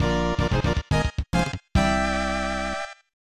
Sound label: Victory Fanfare; Battle Clash; snes